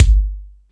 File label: Dance, Drum Machines